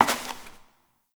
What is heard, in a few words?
Step Perforated Metal; Warframe; Koumei